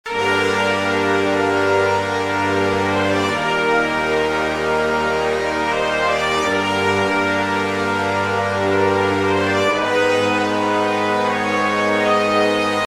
orchestration unison brass, Hear the brass section only; epic orchestral track